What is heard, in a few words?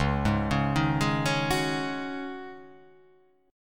C sharp Suspended 2nd Suspended 4th, C sharp